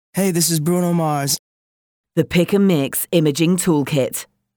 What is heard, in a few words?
Sweet Intro Example, Voice Chorus; Radio Geretsried; Opener